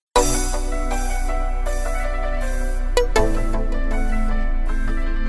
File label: pain with betrayal